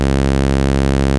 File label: 2600 C#3, ARP 2600; Hollywood Edge - Giorgio Moroder Rare Synthesizer Collection